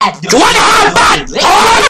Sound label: bad toms